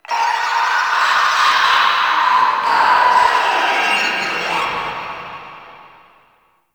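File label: Infested Distant, Ambience; Nineteen Ninety Nine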